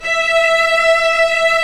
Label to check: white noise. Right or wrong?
Wrong